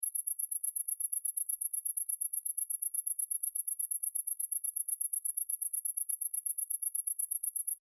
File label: animals insect cricket, Insect, Cricket Chirp; Insect - Cricket Chirp - Loud Clear And Isolated Cricket Chirping; Insects; McKinney Sound